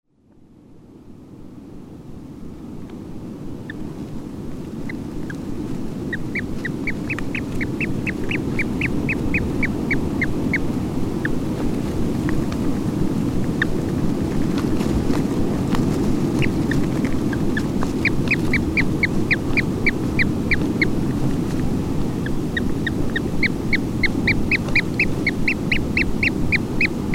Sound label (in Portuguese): Cantos Crias, painho monteiro; aves marinhas